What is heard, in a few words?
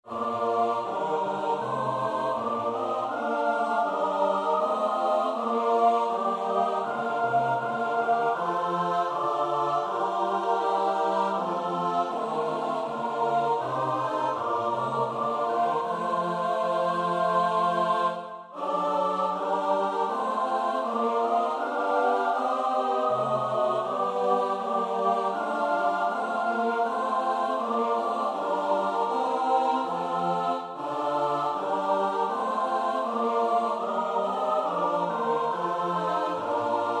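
soprano alto tenor bass; Bach (Johann Sebastiaan); BWV 044; So sei nun, Seele, deine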